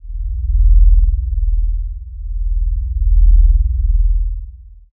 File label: drone